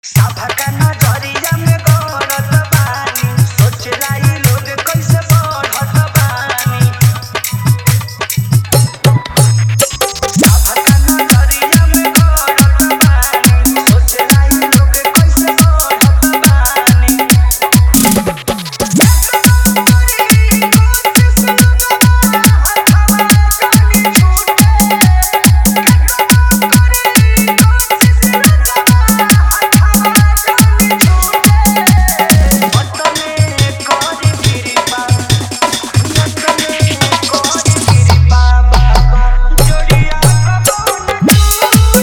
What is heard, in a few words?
Bolbam Hard Dhollki Bass Mix